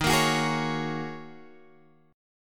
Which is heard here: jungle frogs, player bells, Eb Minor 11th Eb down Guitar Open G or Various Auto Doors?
Eb Minor 11th Eb down Guitar Open G